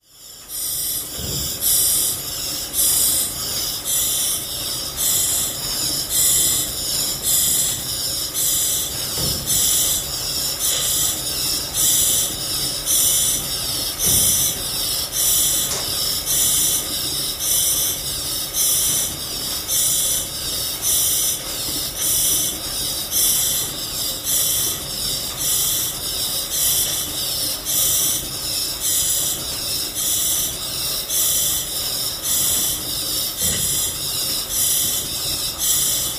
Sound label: Small Steam Pump, W Metallic Machine Rumble, Gas Hiss, Pneumatic Whine In Background.